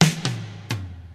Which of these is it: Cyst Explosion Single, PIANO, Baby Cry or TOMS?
TOMS